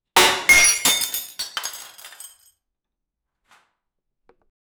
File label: thowing a plate in the, broken